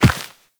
Walk Dirt, Footsteps; Operator

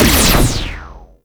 Flux Rifle Shot; Warframe; Laser Rifle; BE Flux Rifle

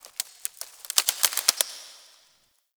Foliage Rustle, Ambience; Warframe; The New War; Zariman

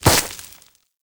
Ram Movement Start, Animals; Duviri

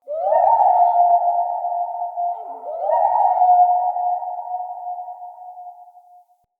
Night Creature Distant; Night One Offs; Ambience

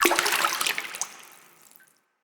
Drop In Water; Player Instrument

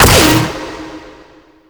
Laser Barrage; Warframe; Raptor

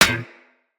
Melee Staff Impact Generic

